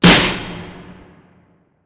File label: hit